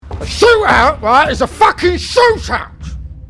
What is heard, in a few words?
buzz